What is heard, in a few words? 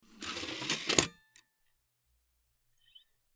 Cd Rom Door Open Tray, computers